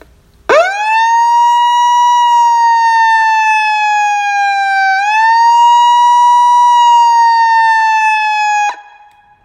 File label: Monitor LH25 Siren; Australian